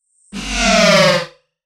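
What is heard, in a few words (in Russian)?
автомобиль будущего пролет короткий близкий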